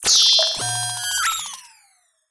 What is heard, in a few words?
scan